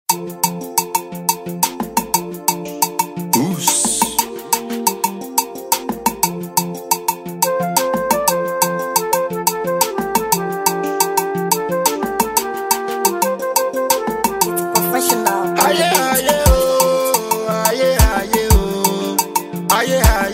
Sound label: Professional Beat